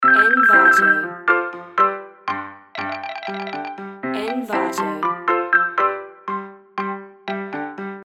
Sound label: comical piano; takerui